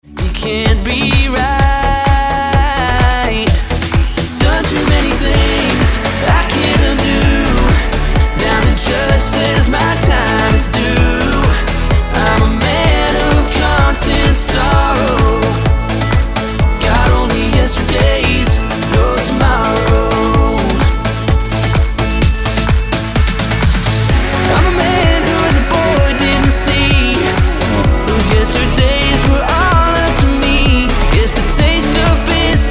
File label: Only Yesterdays EDM